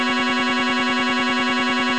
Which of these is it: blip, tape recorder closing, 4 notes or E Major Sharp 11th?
4 notes